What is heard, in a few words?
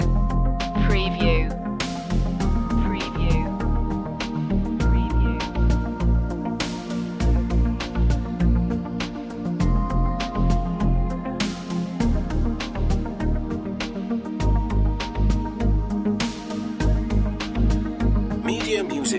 ad style loop